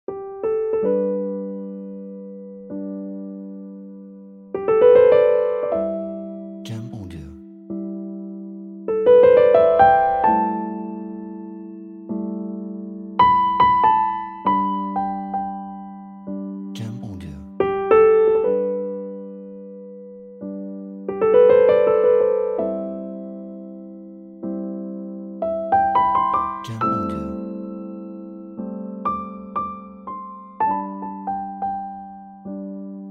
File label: Piano Romantic Cinematic